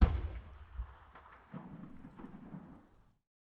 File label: ambient shot stereo